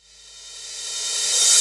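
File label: D2 crash, crash reverse; Best Service Dance Mega Drums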